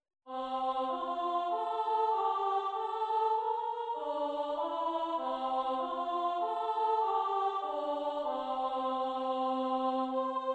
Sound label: Archlute Voice; The Lord Our God is Good and Kind; Voice Part